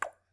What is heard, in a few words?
plop, bubbles